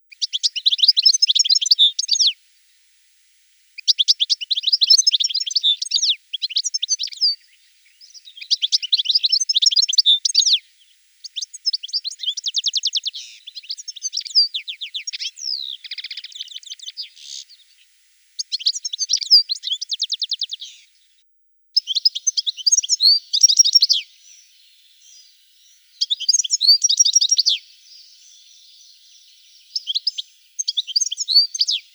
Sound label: Carduelis carduelis